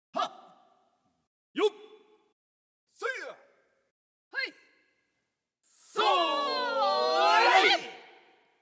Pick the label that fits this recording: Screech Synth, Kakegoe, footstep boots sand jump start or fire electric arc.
Kakegoe